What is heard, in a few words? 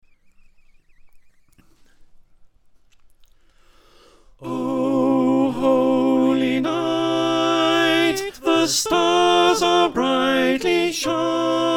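alto, choir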